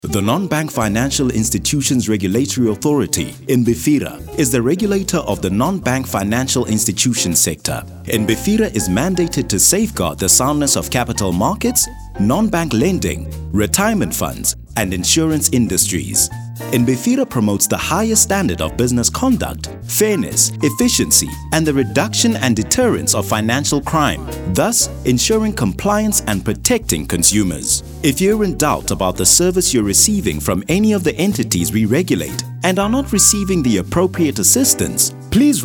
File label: Radio Jingle; NBFIRA Corporate